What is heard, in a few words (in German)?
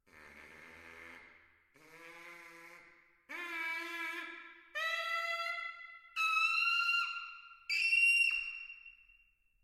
mundstueck buzzing; Mundstückbuzzing über 5 Oktaven